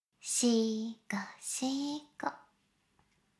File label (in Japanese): しこしこ音声; 少尉; 牧場戦腺